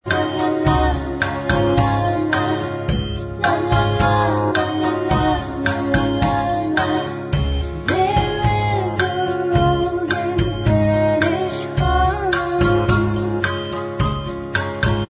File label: Bvox